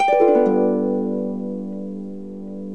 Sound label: HARP GLISS